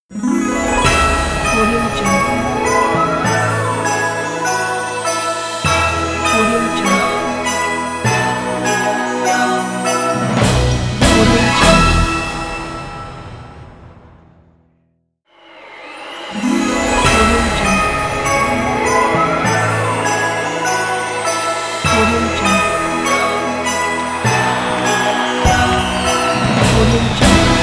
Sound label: christmas magic miracle ident